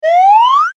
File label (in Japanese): スライドホイッスル上昇